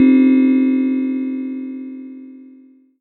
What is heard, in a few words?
Float Away Gong Noise Unpitched; molecules-and-light